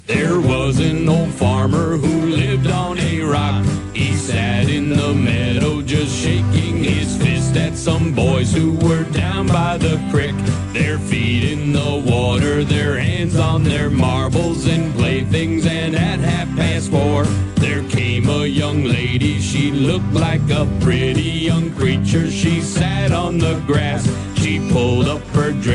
Funny Song

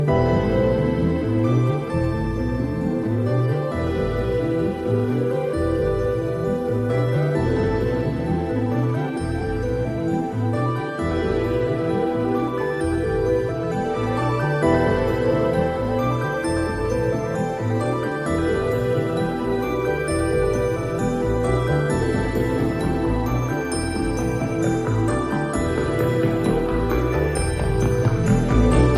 Moog

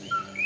Coqui

